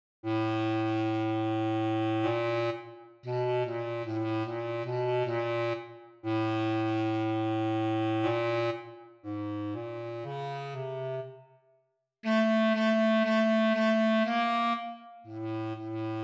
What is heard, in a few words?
Alto Clarinet; Eb Clarinet Solo – Hesitant – which unconsciously conceals being social.